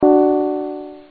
rip; Sensorium; netsound

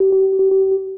Sys Msg Low; FireTalk

